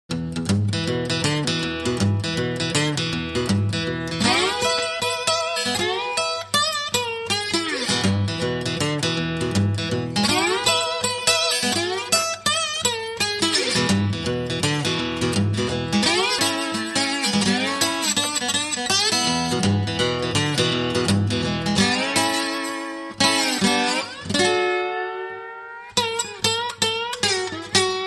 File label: Bottleneck Solo